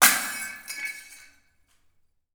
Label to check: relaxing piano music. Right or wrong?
Wrong